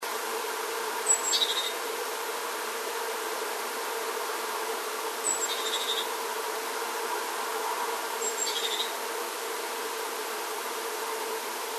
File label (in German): meise schimpfen; nistkasten